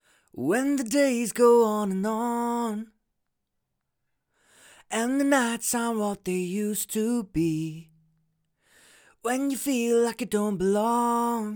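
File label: pop rnb 108 B flat minor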